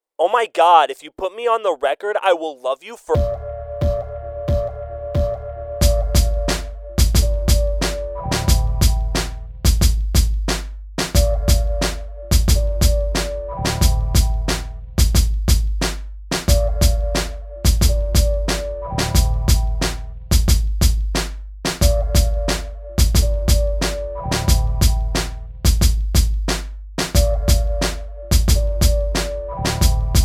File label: cool sounds laid back plug n b groove